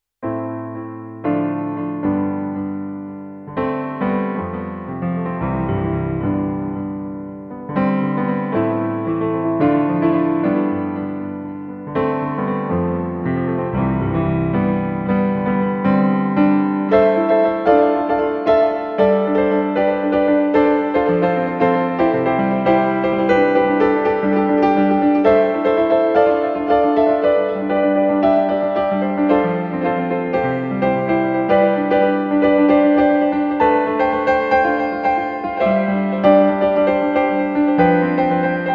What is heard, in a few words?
solo